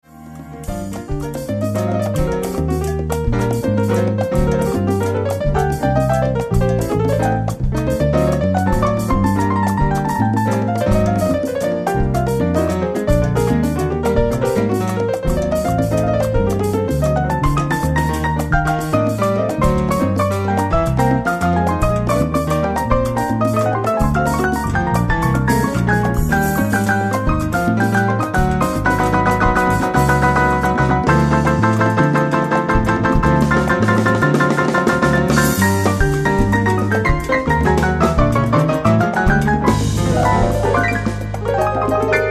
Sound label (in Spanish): La Gran Salsa